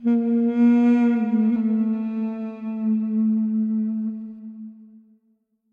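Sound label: sounds of a duduk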